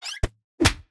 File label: shot